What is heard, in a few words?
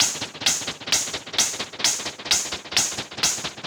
Modular 130BPM